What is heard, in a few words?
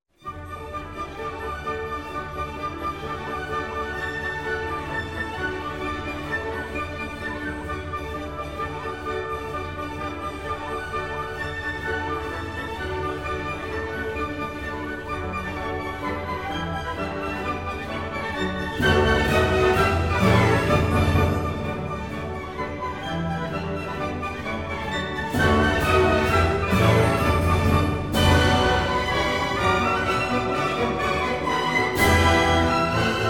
D-Dur in D Major, Molto vivace; Ungarisches Festival